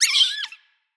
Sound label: Floppet Single Squeak, Animals, Vocals